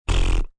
Fart Fat, Mouth effects, fart, short fat one (human voice)